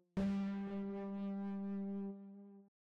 Mushrooms Bloom

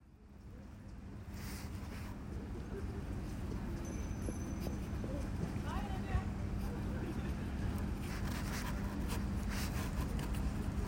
bike

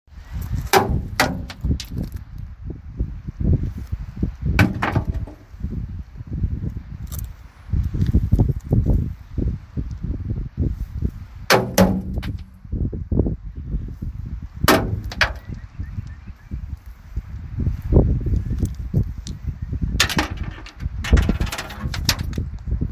Stones

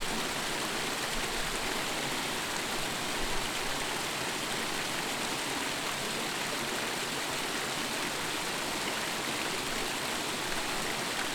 Garden Waterfall, Royal Botanic Garden Edinburgh; Royal Botanic Garden Edinburgh - National Tree Collections of Scotland

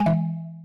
UI Cursor

